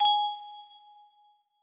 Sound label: melody